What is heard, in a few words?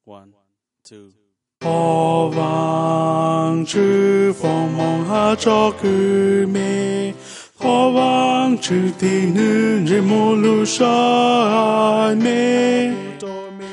tenor